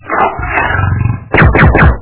monstr odinochnii blizkii multyashnii

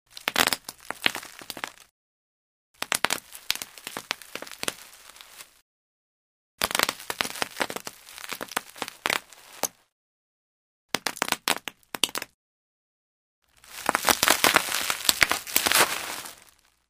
vozdushno-puzirchataya plenka lopayut puziri

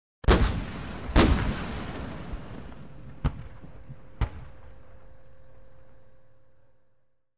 vistrel dvuh tankov i popadanie snaryadov na rasstoyanii

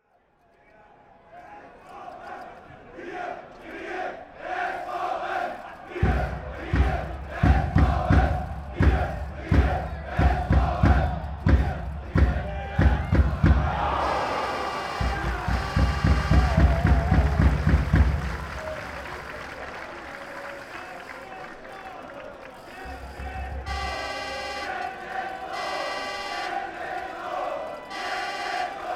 futbolnie fanati

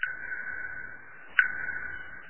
krisha protekaet

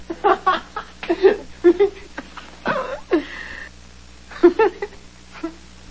hihikane

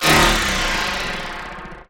zvuk zatuhaniya

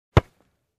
sport futbol odinochnij udar po myachu

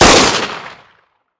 odinochnij pistoletnij vistrel

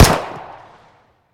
boevoj negromkij vistrel

